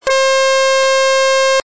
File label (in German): Ton C, Blech